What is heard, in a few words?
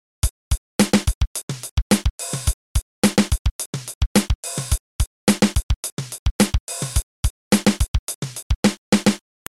Quarter-note bell pulse, offbeat hi-hat played with the foot, ghost note; Quarter-note bell pulse, offbeat hi-hat played with the foot, ghost notes. It’s straight time but it grooves pretty well; drums